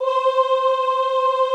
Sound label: OOH C4, Classical Choir; Best Service; Extended Classical Choir